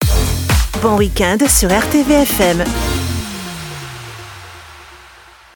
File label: Jingles Weekend